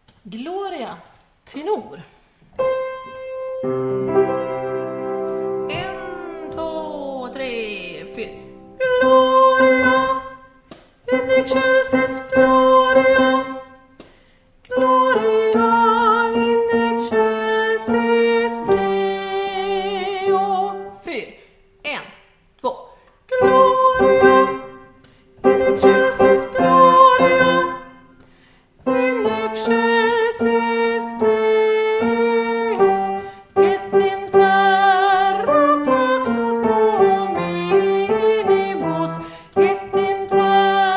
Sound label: tenor; MC Gloria